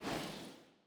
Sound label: ambience room shadow room assassin block open